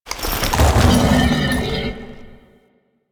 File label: Horse Gallop Brakes; Foley Actions; Warframe; Vehicles; Duviri